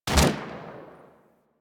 Fire Shot, Weapons; Braton MK One Redux; Warframe

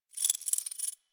Collar Jingle, Pets; Warframe; Zanuka; Zanuka Companion A

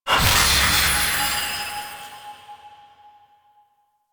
Confetti Burst C, Ambience; Warframe; Duviri; Bombastine Encounter

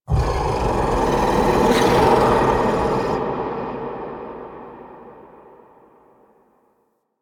Misc Whispers Large; Warframe; Ambience; Entrati

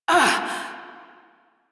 pain, female; Railjack Crew; Steel Meridian

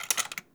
Fire Stop Mech, Flame Thrower; Weapons; Warframe; Ignis Wraith

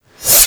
Light Glaive Fold A; Warframe

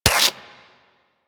Inf Punch Life Steal G; Warframe; Weapons; Infested; Inf Kick Punch

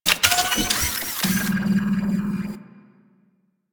Drone Pick Up Relic; Warframe; Ambience; Entrati

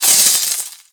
Sword Elemental Electricity Whoosh; Enemies; Weapons; Warframe; Teshin

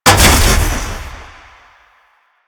Jump Pad Launch; Warframe; Jade Shadows; Ascension

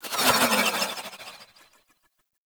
Decoy Arrow Whiz By; Powers; Fey; Saboteur

